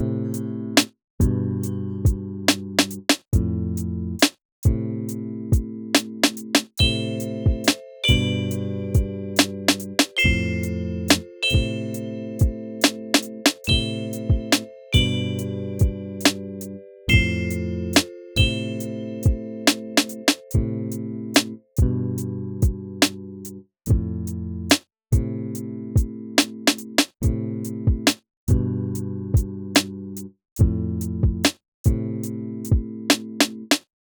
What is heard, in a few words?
Ambiance RnB Chill